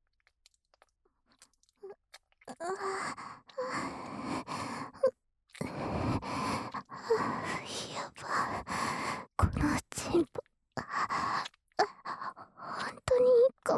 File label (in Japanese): 癒やしのお〇んこマッサージ, ASMR; 苺花; パパ活JK